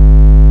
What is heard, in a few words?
SJ SIN, Vintage; INIS